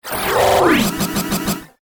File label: Glitch Stutter; Glitch Sound Effects; Sound Design